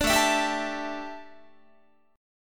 A 7th Suspended 4th, A, down, Guitar; A7sus4 strummed

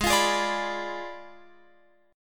Ab Diminished 7th Ab, Guitar, Open F; Abdim7; strummed; x,15,17,15,17,18

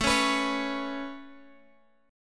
A Major Add 9th A Guitar Open G, chord

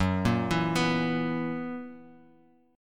Gb 11th, Guitar, Half Step Down

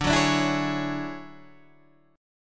D Major Sharp 11th D Guitar Drop D

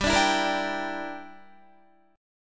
G Minor 6th, Guitar; Gm6 strummed; 15,17,14,15,14,14

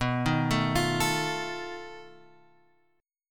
B 7th Flat 5th B Guitar Open G

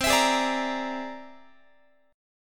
C Augmented 9th, Guitar; strummed; JGuitar

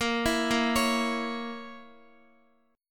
A sharp Diminished Guitar, chord; A#dim; arpeggiated; x,x,10,9,10,9

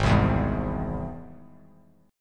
Gsharp Augmented Gsharp Bass Standard; strummed; JGuitar

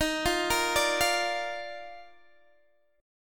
Eb Major 7th Suspended 2nd, Guitar DADGAD

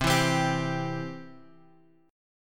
C Major C down Guitar Open G

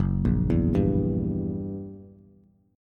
Gb 7th Flat 9th Gb Bass; arpeggiated; JGuitar

